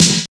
focused snare sample f key, snare drum sample tuned to the F note